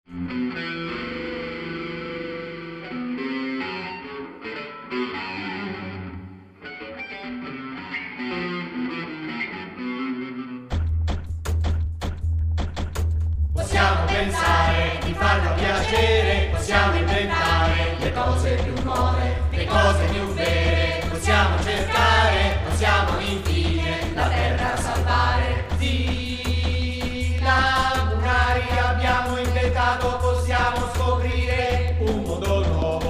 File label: Trash Rap